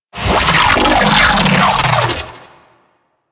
Sentient Vocal Death; Warframe; Sentients; Dolicholyst

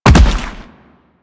Land Heavy Default, Footsteps; Enemies; Warframe; Teshin

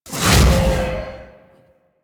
Pit Monster Spit; Warframe; Infested

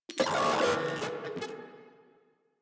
Moa Vocal Pain; Enemies; Warframe

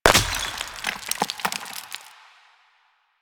Destroy Planter Hanging

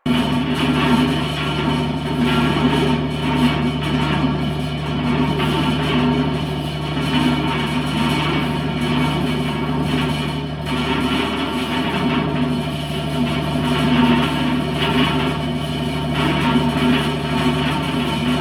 Turbine Loop; Cave; Ambience; Warframe; Stalker Cave

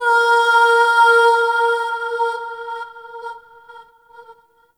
Opera Note; Ambience; Opera House; Warframe; Orokin Moon

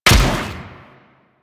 Vomit Gun Shot, Weapons, Infested; Warframe

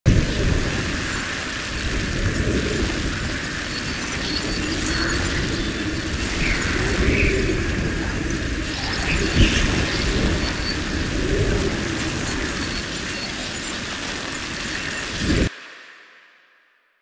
Icy Cloud Loop; Warframe; Weapons; Moa Pet; Cryotra

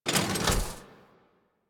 Mech Step Arm Down; Nechro

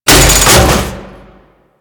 Loot Crate Open; Objects; Warframe

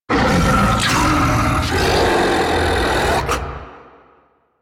Octopede Attack; Warframe

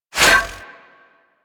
Disarm Dart Shot; Warframe; Warframes; Pacifist

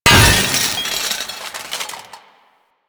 Loot Crate Small; Warframe; Entrati

